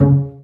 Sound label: BA PIZZ C2; Best Service; Peter Siedlaczek's Advanced Orchestra